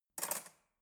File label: cutlery fork drawer handle soft